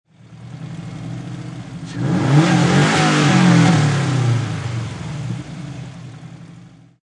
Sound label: Subaru Sti Engine Single Rev; Auto, Power Car, High Performance Car Sound: Subaru STI. Engine perspective. Motor rev, engine revving; Race Car; Lynne Music